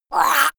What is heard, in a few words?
monster choking high; koerpergeraeusche; comedy-cartoon; soundpool